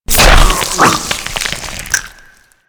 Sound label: Rip In Half, Flesh; Warframe